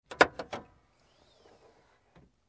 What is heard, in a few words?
Opening compartment in car